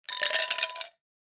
ice cube drop

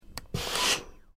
Iron Steam

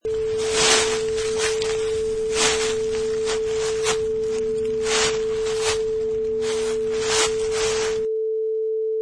Rustling Leaves

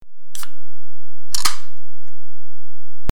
Open Soda Can

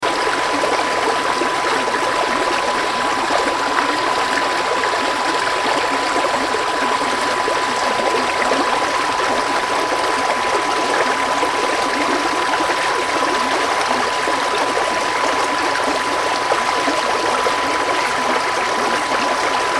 Babb Brook On Bridge

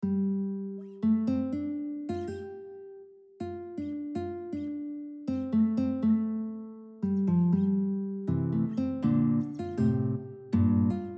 Guitar Trio; Pour Invoquer Pan

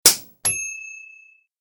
slap

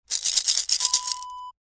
piggy bank shake

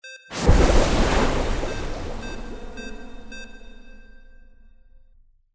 sci fi magic water ball; windy beach audio

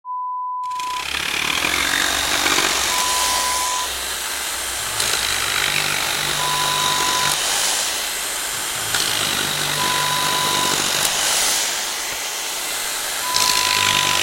Power Tool sounds ELECTRIC SAW

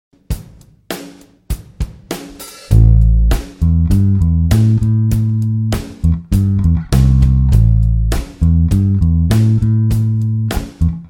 A minor 9 groove

